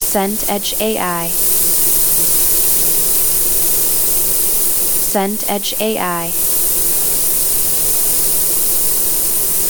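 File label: ambience mountain wind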